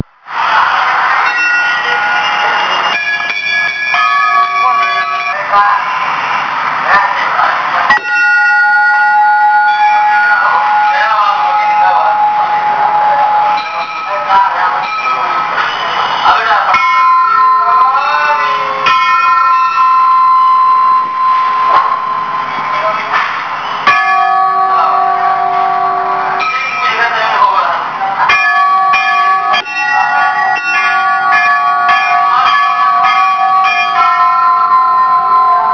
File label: monkey temple